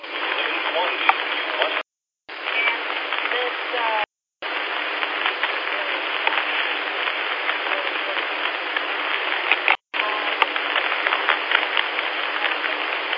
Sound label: Background Noise